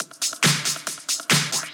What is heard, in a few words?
138BPM Loop; Drums & Perc; F major; Vengeance Samples; Another Day Kit